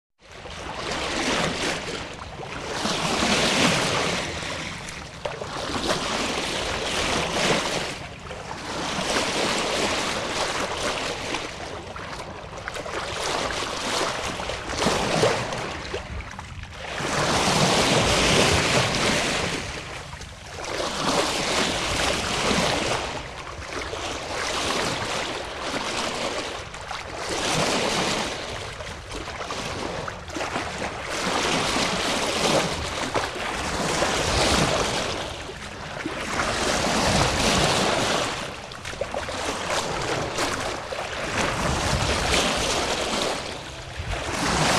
Water Lapping - Busy Movement, Boat In Background Water